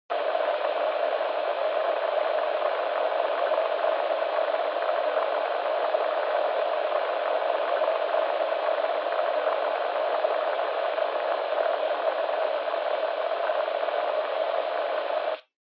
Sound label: Handheld Transceiver Noise Long